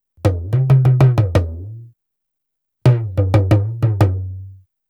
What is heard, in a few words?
ETHNO EXTRA 90; ethno2